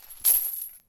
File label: chain; mcheli